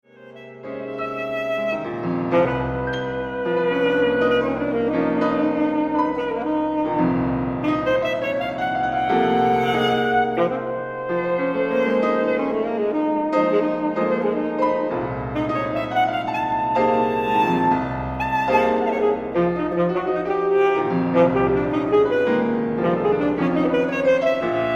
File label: Sax